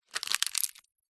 foley dry thick leaf or twig crunch break crush; zapsplat

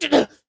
Male Shout of Pain; Screams Shouts 2; soundbits

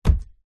footstep barefoot single wooden step

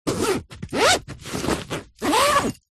industrial tool bag zipper

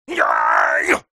human fight karate vocalisation male

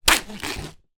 foley package box open with knife; zapsplat

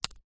food fine wafer cracker snap close up; zapsplat

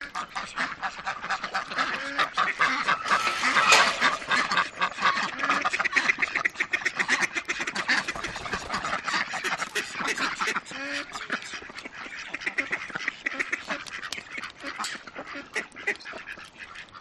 urban farm duck calls feeding time

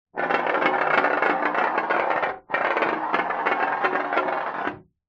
snooker ball roll across glass table; sport

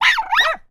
animals dogs x2 barking small; sound effects one

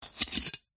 foley ice sharp knife scrape engrave; zapsplat

